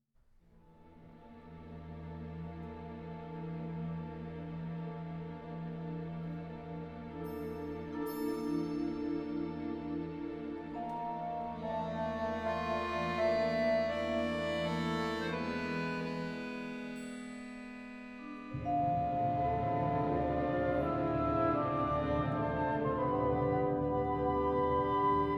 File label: accordion concerto; Kyllonen Timo-Juhani